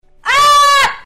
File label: aaah